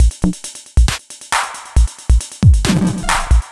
Bpm136 Ethnotech Break, dubstep drum loops

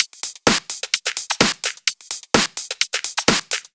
Loop, 128 BPM

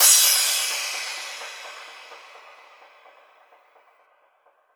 FX Impact

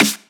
Claps & Snares

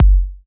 Bassdrum

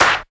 Clap, Dubstep One-Shot Drums; Prime Loops; Dubstep Illusions

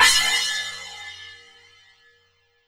Crash, Cymbals

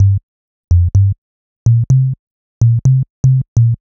126 G C BASS, Funky Underground bass loops; Loopmasters; Funky Underground Vol 2

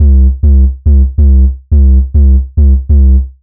140 C BASSLINE, Dubstep

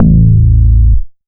Bass Shot A

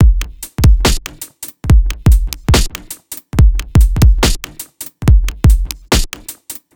Low Tide Break, Bpm 142, Dubstep, Drum Loops

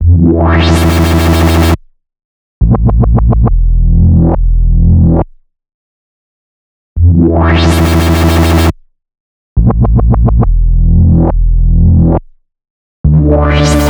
Bpm138 Bass Loop E; HardKnock; Prime Loops; Dubstep Illusions

